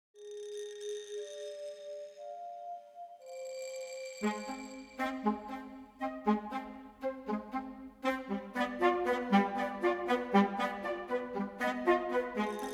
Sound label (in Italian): Fagotto, Muzyka Instrumentalna